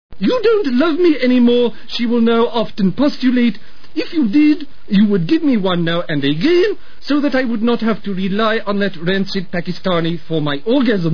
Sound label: orgasms; sketches